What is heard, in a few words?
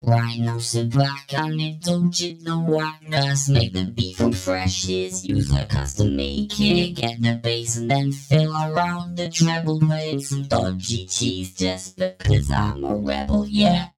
Dew Void Vox 138bpm